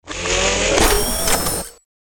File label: Mermaid Servo